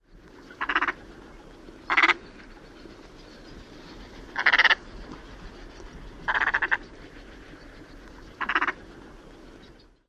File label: female flight chatter